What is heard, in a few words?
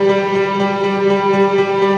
FX, Futurist Drum & Bass